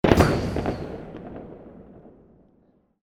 explosion

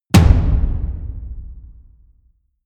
drum hit simple medium

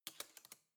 cutlery spoon drawer place soft

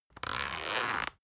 zipper soft

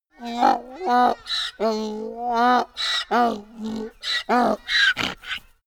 donkey cry complain

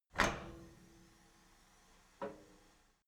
cleaning machine open handle quick

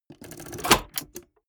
mechanics small movement

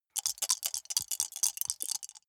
small four rattle in hand closed

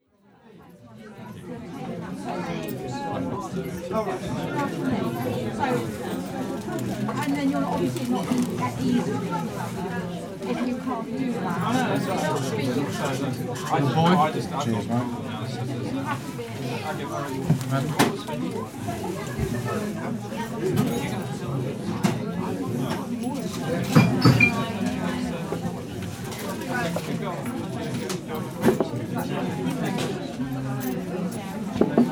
pub at lunchtime in london

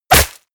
stylized fist punch

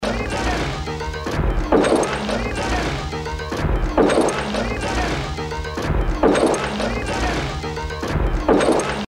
musical fx loop

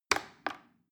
plastic button little click regular slow

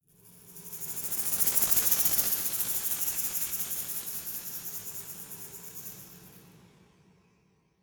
resonator rattle dynamic

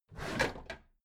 packed drawer smooth clatter open heavy halfway

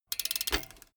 bmx bike freewheel brake

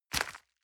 envelope grab hard

